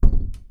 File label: public door shut; garrysmod; sunabouzu